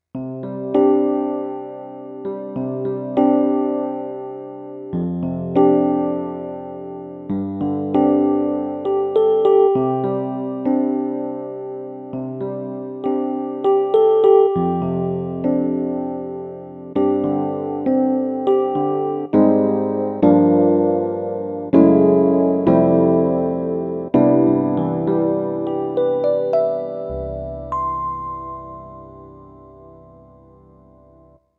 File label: Casio PX S3000 Dynamic E Piano